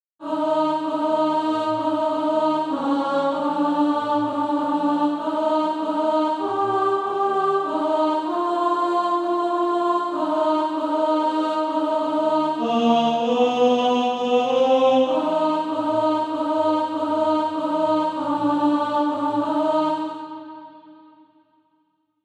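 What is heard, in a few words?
alto; Fling Out the Banner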